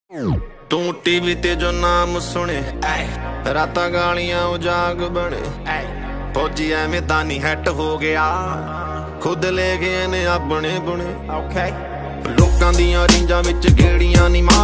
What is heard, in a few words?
Punjabi Ringtone